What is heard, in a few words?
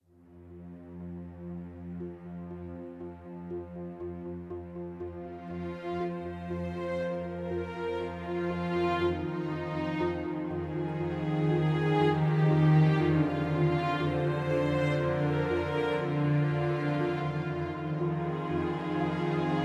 Revelation Ambient